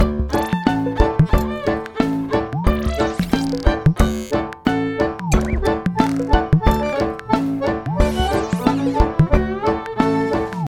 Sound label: Dadgad; Sony; Xperia Movie Creator Music